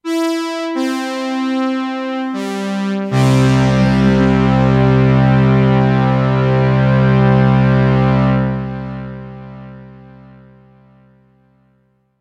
P6 Horn

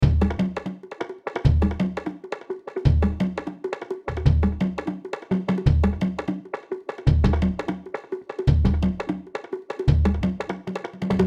Drumloop

